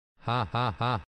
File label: haha